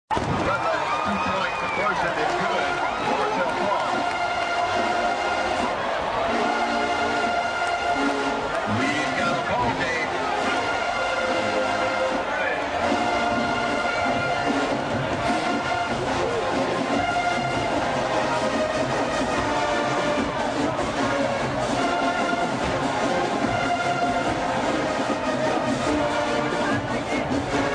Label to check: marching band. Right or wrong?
Right